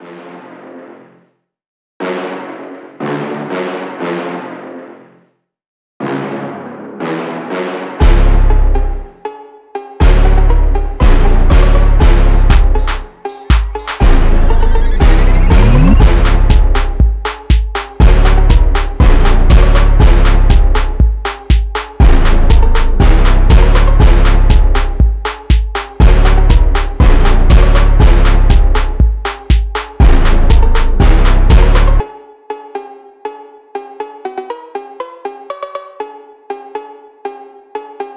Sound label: Brass